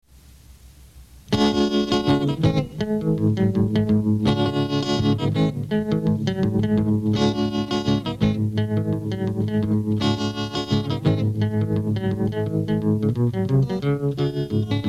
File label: Guitar